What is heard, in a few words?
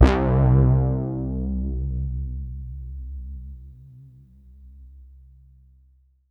C3, Synth Line; 90 s Sample CDs; NiceBeats; SynthLine VOL-23